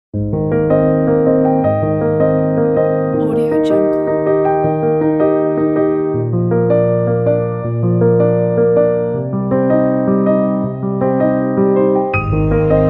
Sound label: uplifting piano and strings